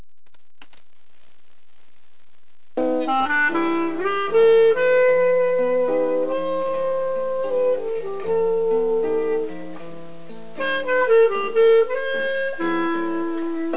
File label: elegant, jazz